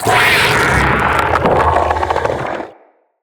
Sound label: Spider Vocal Attack; Vocals; Root Spider Mother